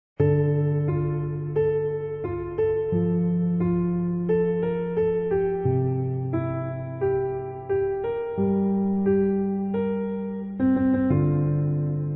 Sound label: Easy Piano; Instrumentais